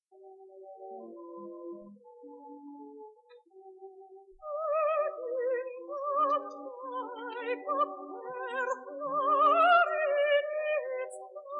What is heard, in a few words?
air